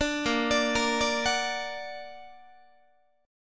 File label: A sharp Augmented A sharp, Guitar; A#+ arpeggiated; 17,18,17,20,18,17; 4A,4E,5A,5D,5G#,6C#; guitar chord